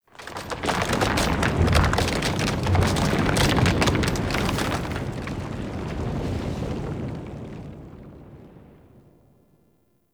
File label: Earth Quake Rumble; Warframe; Tau